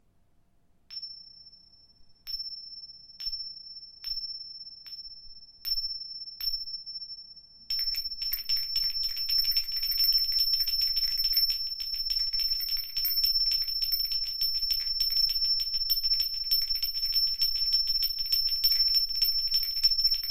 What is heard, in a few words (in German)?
Zimbeln